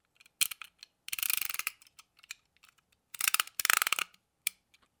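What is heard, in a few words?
utility knife sound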